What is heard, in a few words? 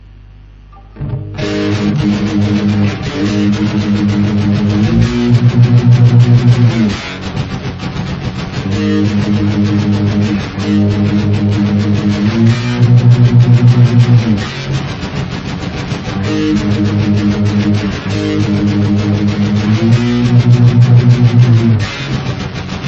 Riff